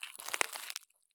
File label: walk gravel; Escape from Tarkov